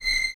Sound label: Violins C 7; Miroslav Vitous; String Ensembles